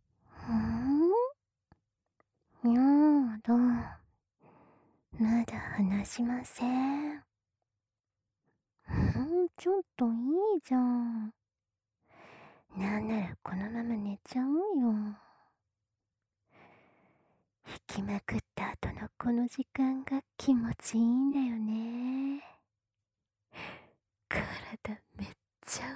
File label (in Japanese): ピロートーク・キス; ダラダラエンドレスキス